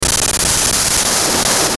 Static Stutter Sweeper, Static Analog Communication; Production Element Imaging Element Accent Transition; Blastwave; Static Sound Effects